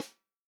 snare drum, POP SNARE CHROMATIC; Best Service ProSamples vol.43 - Real Drum Kits

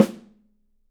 Studio Snare; 3D Percussives; Studio Snare 01

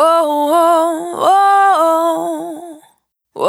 Urban Dry, Single Voice Melodic Loops

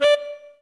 SHORT SPIT; Greytsounds - Scott Peers Sound Engineering

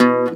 C.GTR SOFT B1; USB Soundscan vol.42; AKAI

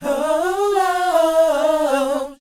SHOO B G#, R&B Dance, vocal; Spectrasonics Vocal Planet

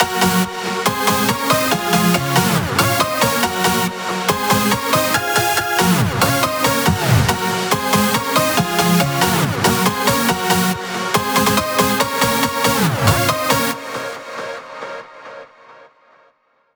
140BPM G#; Vengeance - Essential Clubsounds Vol.4

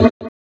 Vinyl Fx; Sonic Foundry (Sony Creative Software); Esoterik Beatz

